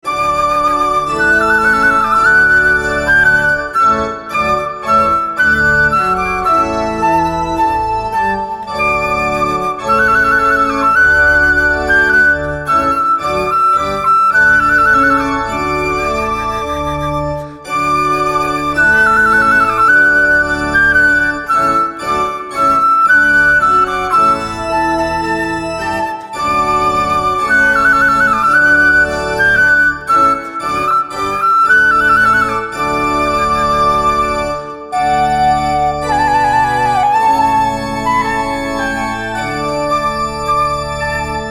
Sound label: trumpet organ, chiesa; fiato alle corde